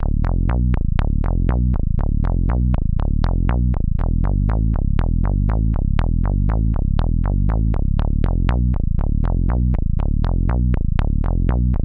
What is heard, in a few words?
WAVES Element 2 Bass